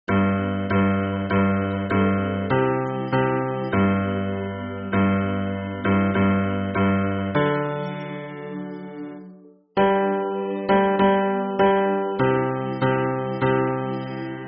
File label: hymn bass